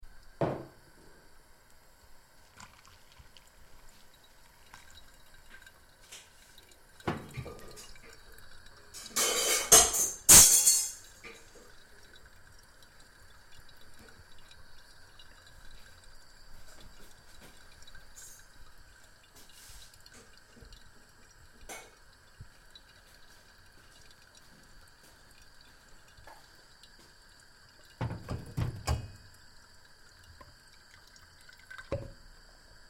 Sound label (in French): cafe grille